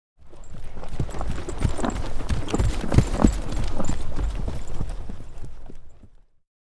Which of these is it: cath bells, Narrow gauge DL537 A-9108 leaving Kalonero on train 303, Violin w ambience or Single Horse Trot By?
Single Horse Trot By